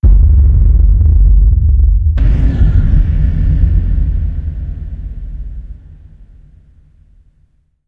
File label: end screen hits; ambient; source engine; episodic; intro